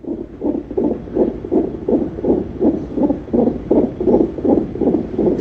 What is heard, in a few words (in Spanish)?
latido